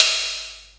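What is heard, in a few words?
cymbal, drum machines, MFB-512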